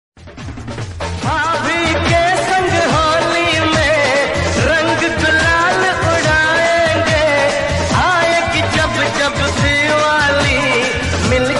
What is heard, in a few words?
old song; hum saath saath hain